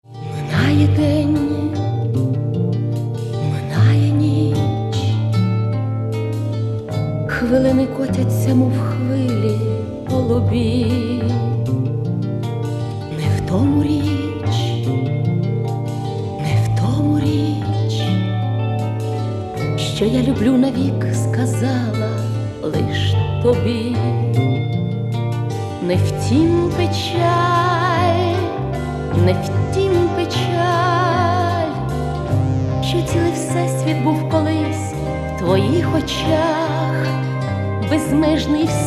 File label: vinyl